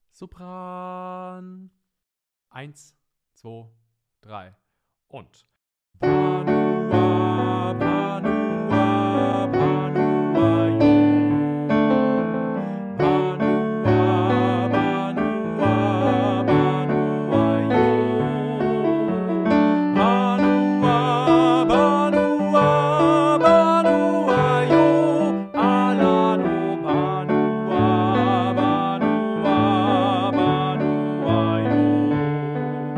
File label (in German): Sopran mit Tutti Klavier; Banuwa